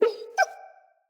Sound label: Wolf Cub Trapped Call Close; Animals; Wolf Cubs; Orengal Wolf Cub C